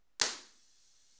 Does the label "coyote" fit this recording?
No